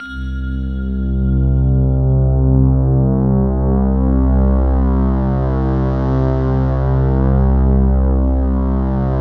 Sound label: World of Synthesizers, SYNBELL